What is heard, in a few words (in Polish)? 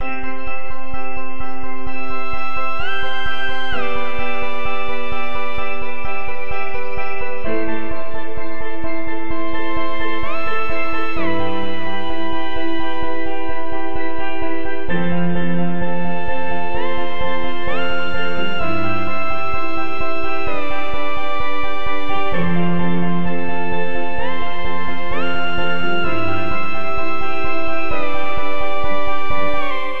najlepszy samsung galaxy s22, dzwonki; telefon komórkowy